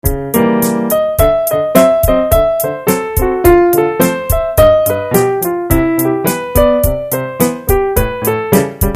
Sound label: piano